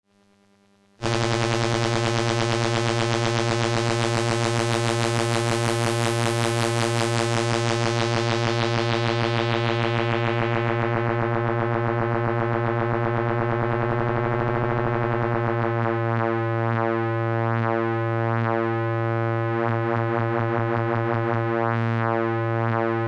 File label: Ring Modulator, Synthesizers; G.D.Shaw's Minisonic 2 synthesizer